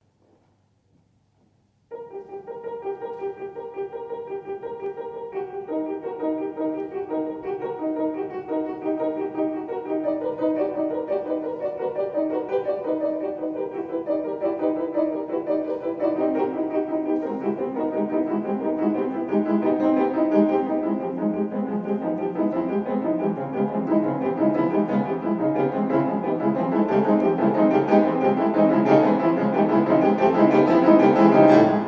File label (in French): intervalles